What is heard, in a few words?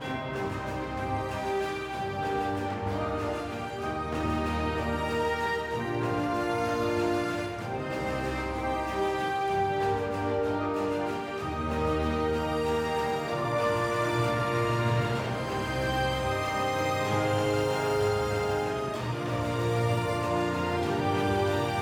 BGM free spin, slots; Realm Saga